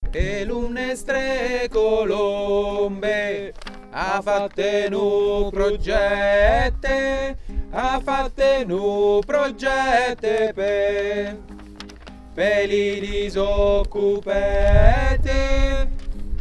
tenors couplet; Ganges

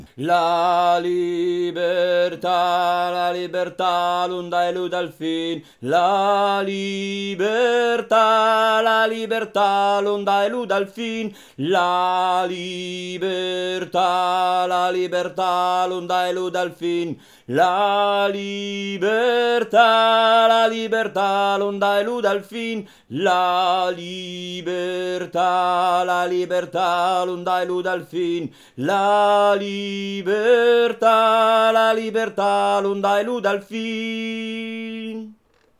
joan peirol finale tenori

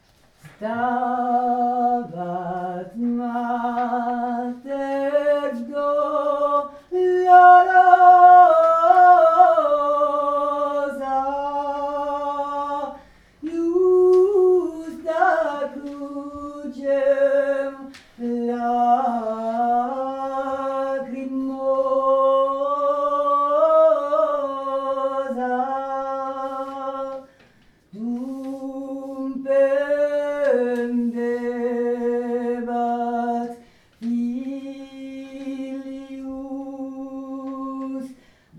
Stabat Mater

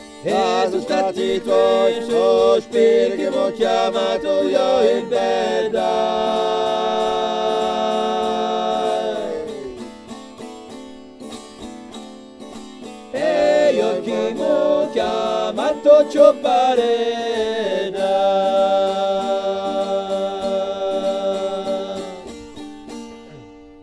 Canto alla ciopareddra VOIX; St Pierre Diois

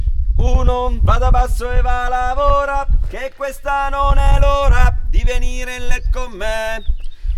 TENOR; Marito Mio; Pellafol

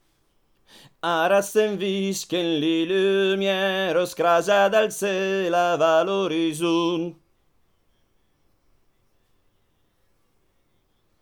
soprani; Joan Peirol

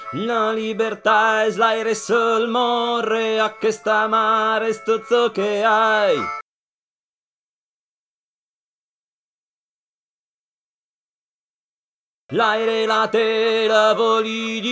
joan peirol tenori

